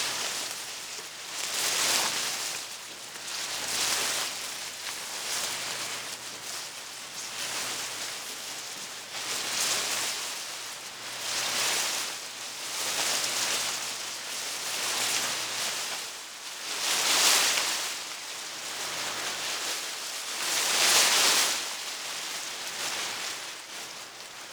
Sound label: Leaves Wind Loop; Ambience; Props; Warframe; Nokko Colony